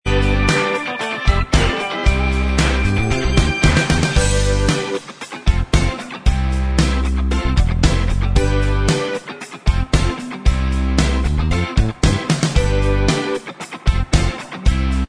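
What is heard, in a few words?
instrumental, backing track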